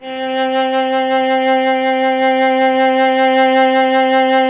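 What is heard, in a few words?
strings cello C; Miroslav Vitous; Solo Instruments VOL-1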